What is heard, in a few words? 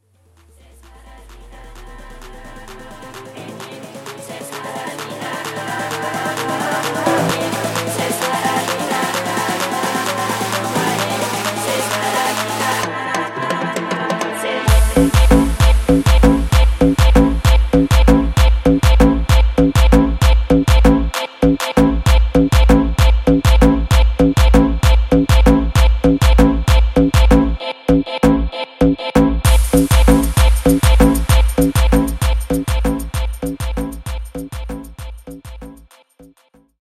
guaracha